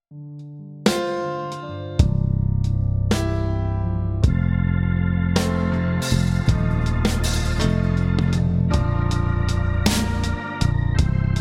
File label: Blues